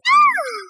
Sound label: hiss